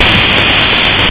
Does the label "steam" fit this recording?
Yes